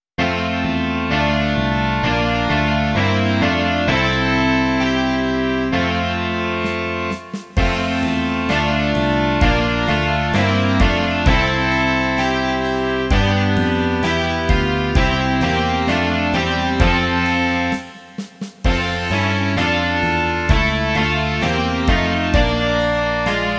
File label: rock